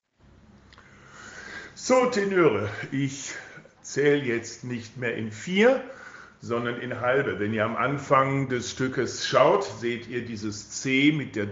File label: Tenor Arbeitsempo